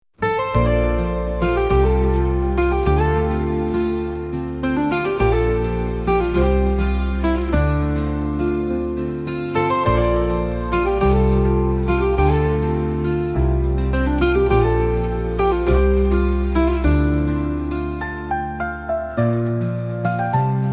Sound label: indian background music